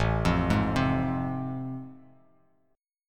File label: A Minor Major 7th Sharp 5th; arpeggiated; AmM7#5; 5,8,6,5; JGuitar